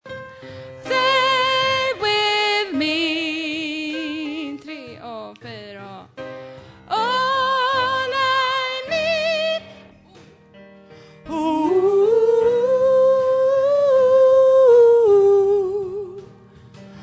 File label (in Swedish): bas